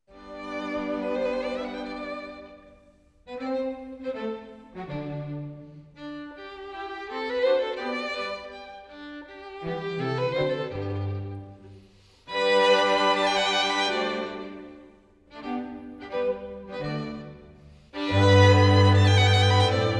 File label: String Quartet in G Major Allegro; String Quartet No. 2; Op. 18 No. 2; Ludwig van Beethoven; Beethoven - String Quartets Nos. 1-16